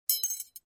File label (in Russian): zvuki viazaniia